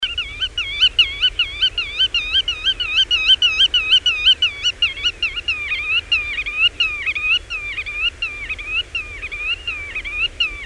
Pittima reale

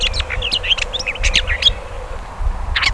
Averla cenerina

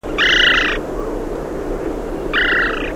Albanella pallida

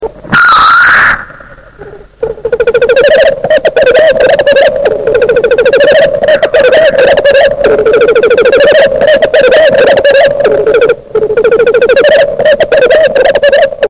Gallo forcello o fagiano di monte

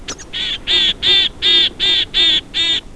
Cincia siberiana

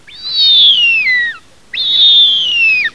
Avvoltoio degli agnelli

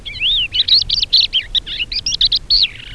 Cappellaccia di Tekla

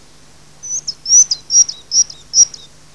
Balia caucasica o dal mezzo collare

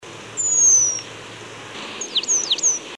Pendolino, uccelli europa

